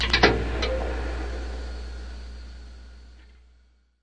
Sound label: factory punch down; UNUSUAL KITS and FX